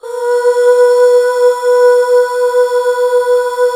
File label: UUHH, Back Uuh Fem